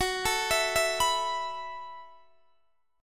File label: F sharp Minor 11th, Guitar, DADGAD; F#m11 arpeggiated; x,21,19,21,19,21